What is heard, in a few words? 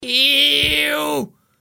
Ewww